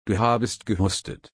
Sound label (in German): husten